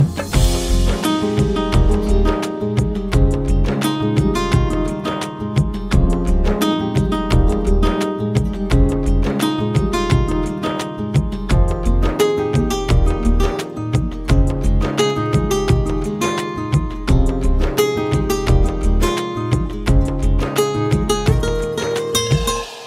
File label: hindi ringtone